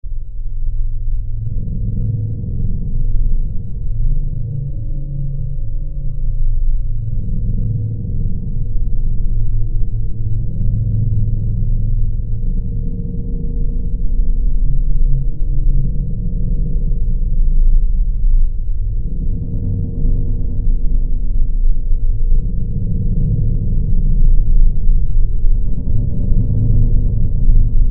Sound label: breath, dark ambient